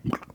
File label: Panel Toilet Plunge, sound effects; Among Us